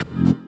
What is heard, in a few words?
F STAB, funky